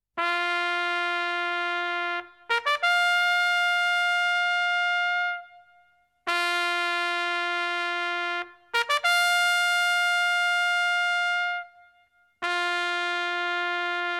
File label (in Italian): TROMBA MILITARE